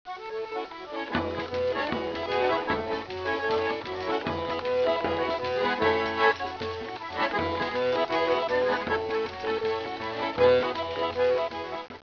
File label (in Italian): organetto